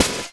Drum & Bass, 140 BPM; Ueberschall; Drum & Bass Resonance

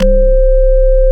Korg CX3, C 1; Back In Time Records; Korg Universe VOL-1

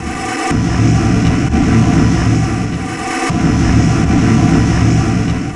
FX Loop, Drums & Drones

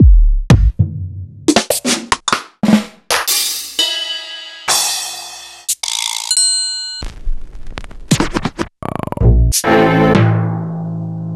Kit; proteusvx

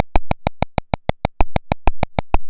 Apple II FX Running; 8-Bit; Chiptune

